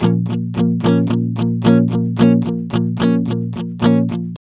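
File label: ROCK A minor 110, Vintage Blues Guitar; USB Soundscan vol.22; AKAI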